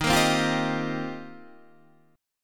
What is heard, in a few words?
Eb 6th Add 9th, Guitar, DADGAD